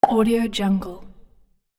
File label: mouth pop